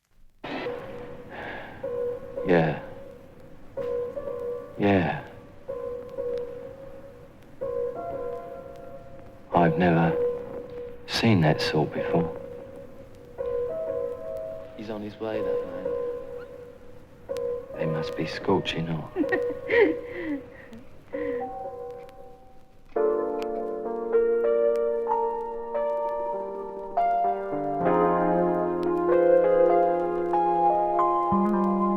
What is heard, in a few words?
'90s UK Jazzy Trip-hop